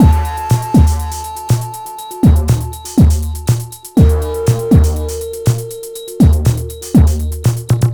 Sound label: Drums Strange